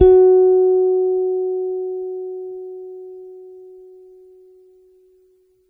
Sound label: Gs F#5 soft, Bass, Pedulla Thunderbass; Giga Samples Collection